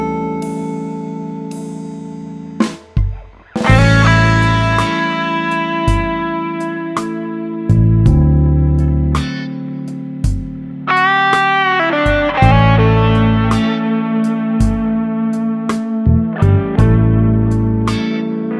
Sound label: slowblues